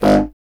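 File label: wind contrabassoon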